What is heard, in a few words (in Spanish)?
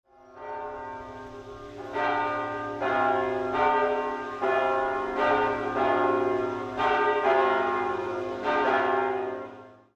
campanas